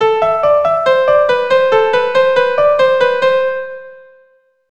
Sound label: Eighths